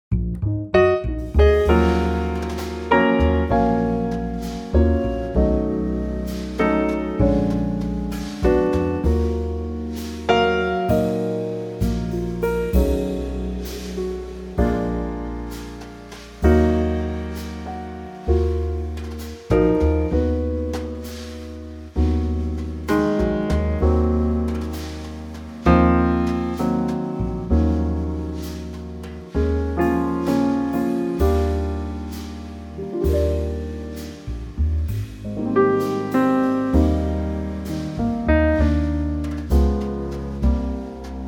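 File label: Ebm, Backing Tracks for Male Vocals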